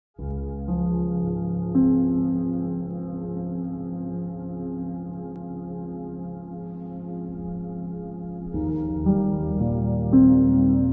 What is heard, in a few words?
Relaxing Piano Forest